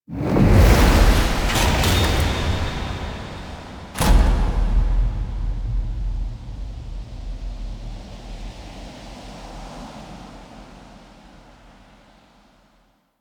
Fight Start Stinger; Kullervo Encounter

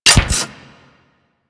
Microwave Pistol Mech; Grineer

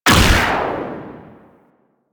TnT Shot; DE; Warframe; Weapons; Archwing; Powers

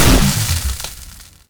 Dead End Crystal Charge; Echoes; Warframe; Ambience; Entrati

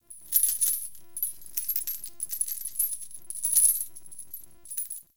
Witch Station Material Texture; Soul Plane

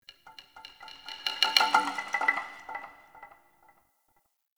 Wood Stick; Warframe; Clan Dojo; Dojo Randoms

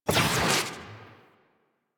Camera Spot Move; Warframe; Chimera Prologue

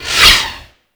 Space Pod Rocket By A, Vehicles; Grineer; Warframe

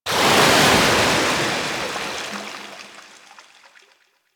Ocean Wave Crash Large; Warframe; Grineer Ocean

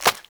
Agaric Mushroom Grow; Objects; Pickups; Resource Materials

